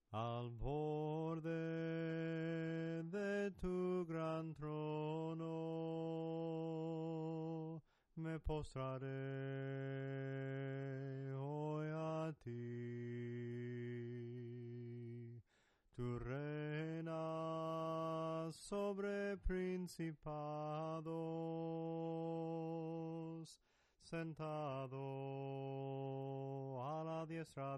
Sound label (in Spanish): SOPRANO CANTADO